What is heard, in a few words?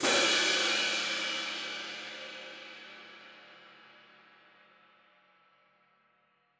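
Loop Win Cymbal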